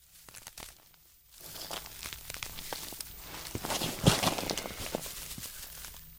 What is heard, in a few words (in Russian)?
shurshashij tihij zvuk probirayushegosya medvedya skvoz kusti